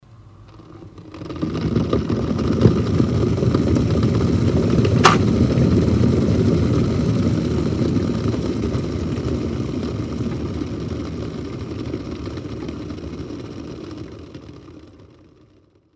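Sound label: sound of kettle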